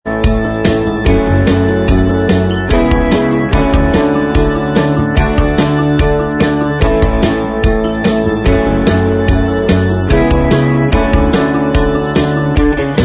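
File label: instrumental, backing tracks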